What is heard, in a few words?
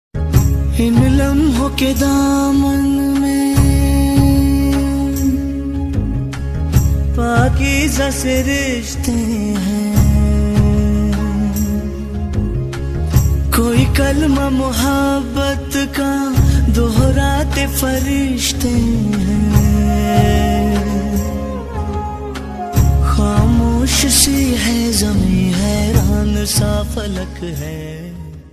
hindi ringtone